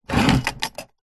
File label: wood box small slide short fast hard ground; foley; zapsplat